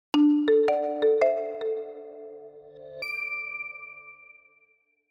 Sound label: JINGLE